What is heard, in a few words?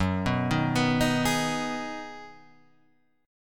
Ab Minor 7th